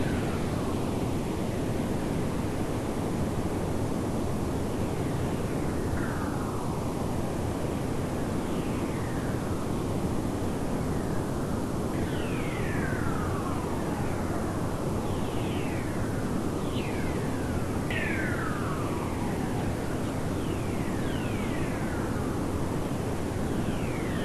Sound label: WFR audio emfisis